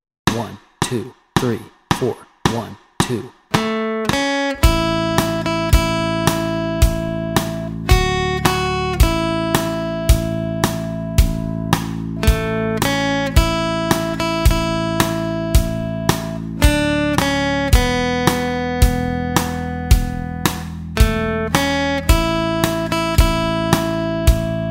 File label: Kum Ba Yah Melody Track, guitar